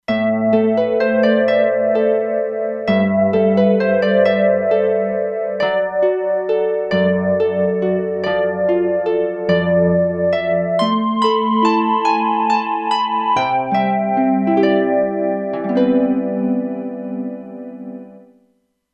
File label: Exotic Harp, E-mu Proteus-2; Factory Presets